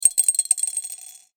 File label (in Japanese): ガラスで チンチロリン